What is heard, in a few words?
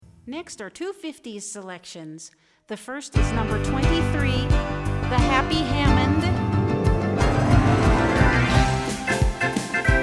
The Happy Hammond